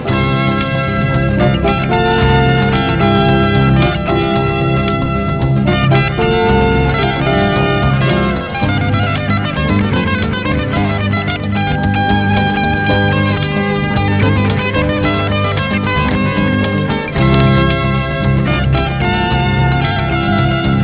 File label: JOYFUL NOISE